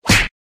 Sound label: kick player; KickSurvivors